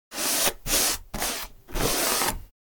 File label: Paint brush painting wood various short strokes